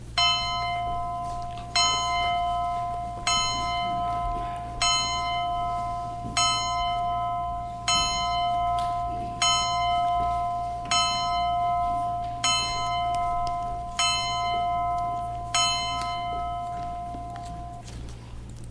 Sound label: Bell Toll; Bell-ringer; "announces" the service to the neighborhood; Bell-ringer Mark Carlson "announces" the service to the neighborhood; Bethel UMC